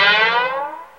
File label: FLANGE GTR, Funky GtrLicks; E-MU Formula 4000 Series Vol. 1; Hip Hop Nation